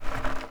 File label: Wood Stool Drag Scrape